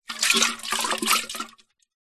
liquid water in metal canister short movement splosh splash